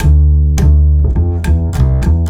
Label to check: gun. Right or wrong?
Wrong